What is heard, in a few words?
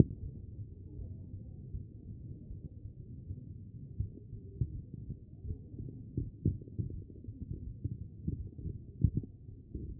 A CHEST; B MODE